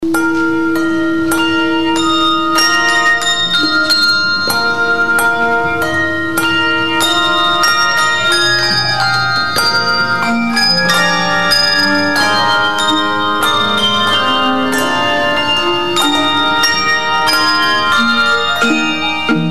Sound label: bells